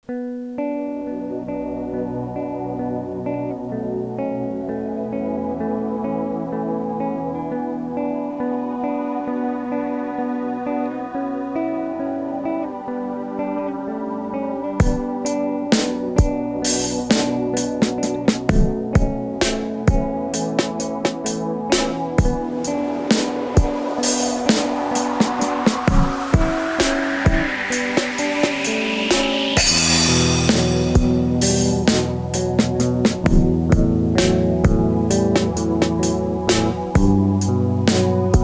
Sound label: GUITAR